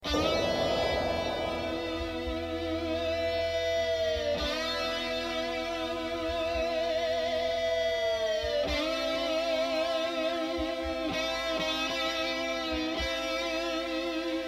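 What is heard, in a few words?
isolated guitar solo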